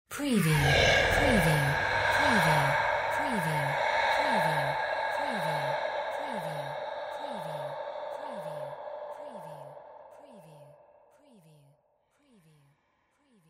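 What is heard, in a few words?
sci fi whispers spooky reverse; windy beach audio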